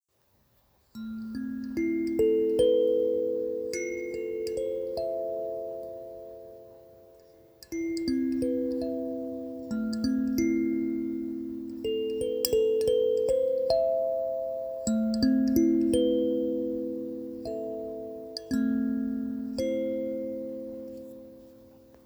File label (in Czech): b9 a moll, kalimba hokema